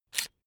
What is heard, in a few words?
electric drill template slide out medium; maschinen; bohrer; soundpool